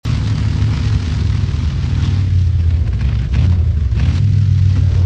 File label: slowdown, vehicles cars; bentley blower; lwcars